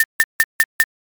knife

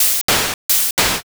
breathing

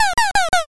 witch laugh

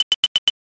knife

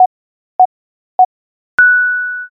jiho

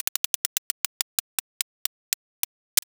roulette

